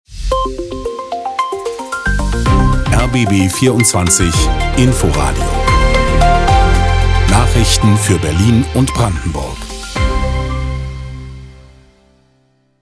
Orange Nachrichten Kurz

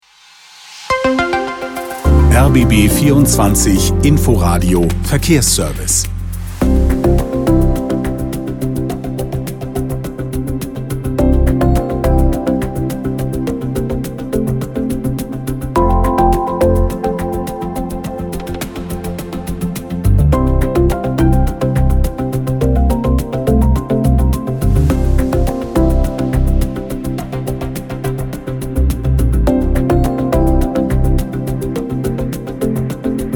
Verkehr

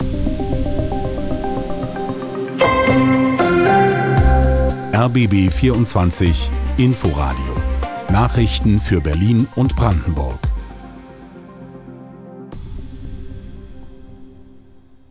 Blau Nachrichten Kurz; swr3

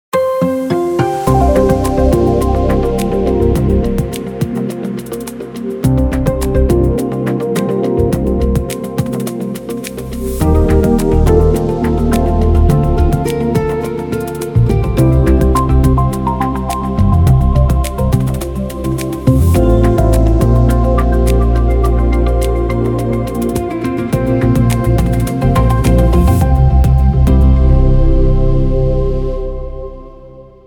Wetter

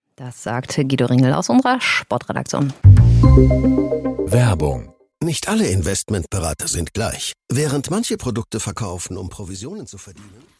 Werbetrenner

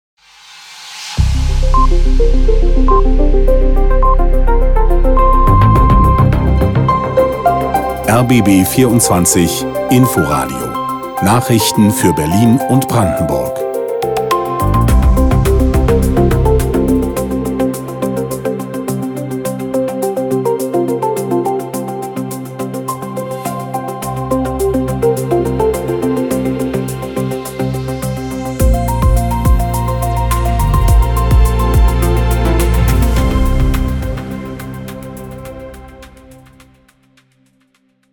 Nachrichten Lang

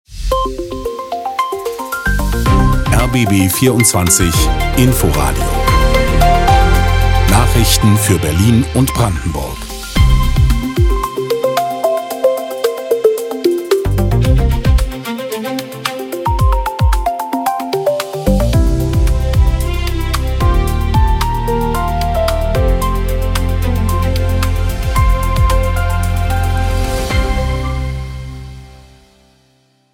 Nachrichten Lang

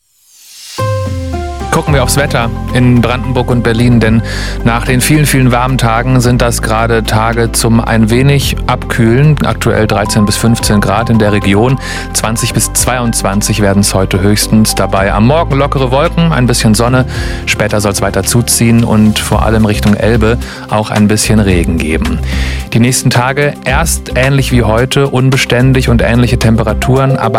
Wetter

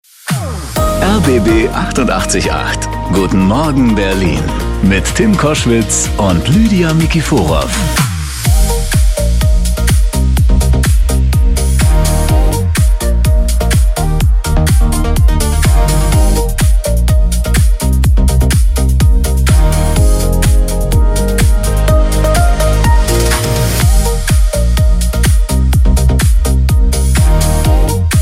Opener B mit SV

